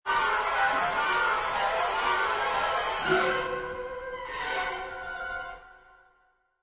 Orch Chro, Orchestra string and wood wind section playing mysterious sounding runs; Orchestral; Musical; Michael Huang